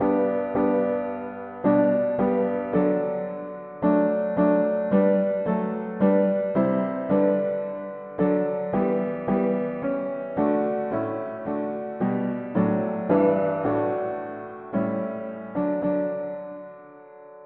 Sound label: mono piano, hymns; O Lord we adore Thee